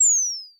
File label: ZAP HI PTCH, Dual Percussion Synthesiser; Klone